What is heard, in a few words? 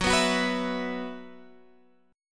Gb 7th Suspended 4th Gb down Guitar Drop D